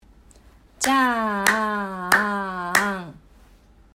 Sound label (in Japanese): ジャーアーアーアン